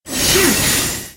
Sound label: shoot; raising heart; nanoha